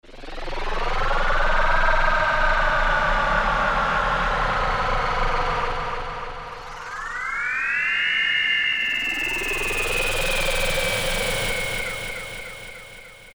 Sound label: Surrounded, Korg MS2000; Factory Patches